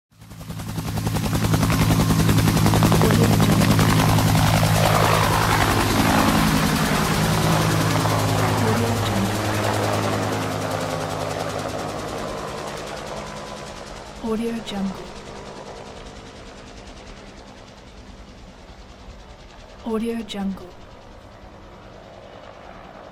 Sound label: helicopter flies away; pure-noise